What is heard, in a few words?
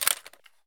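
mp5 weapon bolt jam; Escape from Tarkov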